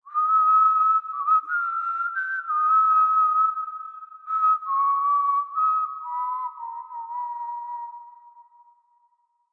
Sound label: Whistle; Age of Conan Hyborian Adventures; Whistle in Tortage